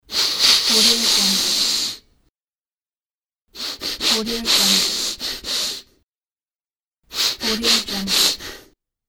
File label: sniff